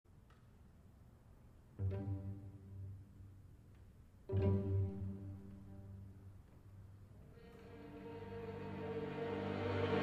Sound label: trill